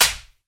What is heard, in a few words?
slap